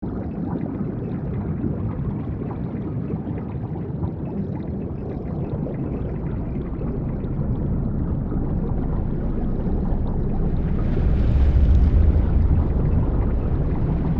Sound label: Submarine traveling underwater perspective; vehicle